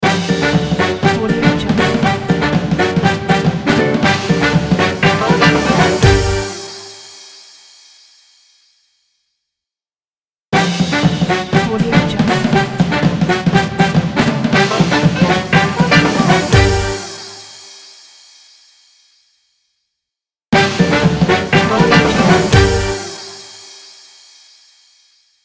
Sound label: swing ident